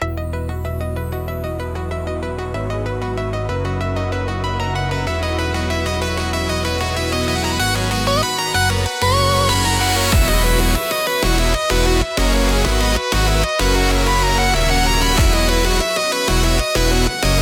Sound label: world pulse, bgm